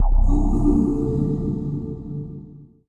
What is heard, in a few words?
Deep Bubble Hit Low, stinger